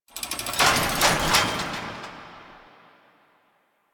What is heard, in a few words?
Arsenal Platform Rise; Interior; Ambience; Warframe; Nineteen Ninety Nine; Tailor Shop